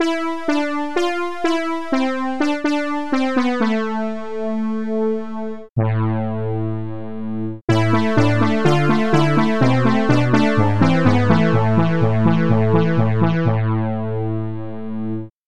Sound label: synth brass